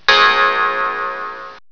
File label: chime